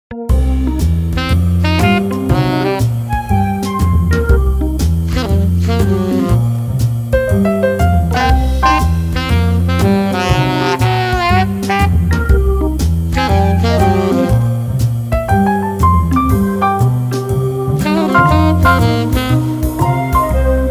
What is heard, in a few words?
Jazz